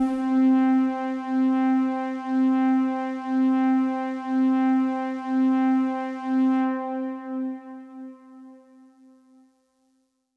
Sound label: Pad C, Synth Prophet One Shots; Electronisounds